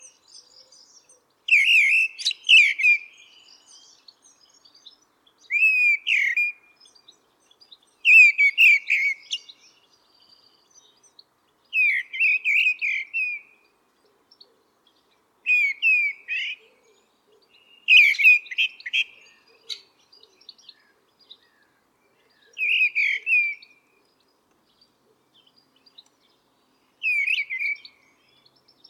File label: Mistle Thrush; Holywell; passerines